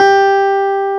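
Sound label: clavinet G4; AKAI S-Series CD-ROM Sound Library VOL-8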